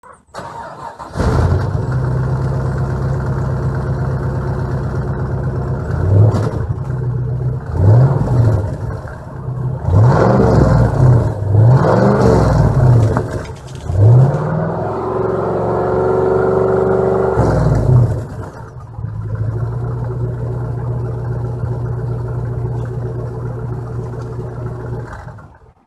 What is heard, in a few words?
monaro blown exhaust